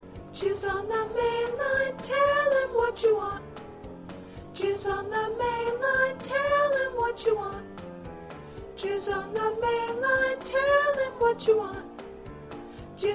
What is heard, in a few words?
Soprano, choir